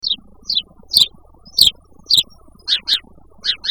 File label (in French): moineau